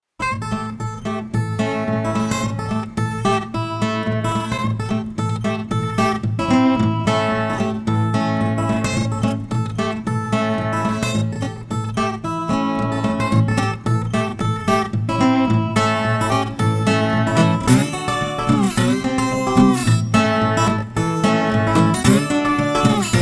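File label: Street Guitar Acoustic Picking, 110 bpm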